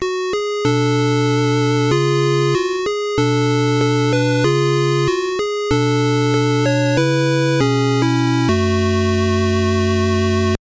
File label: Match Lost; Honoo no Toukyuuji Dodge Danpei 2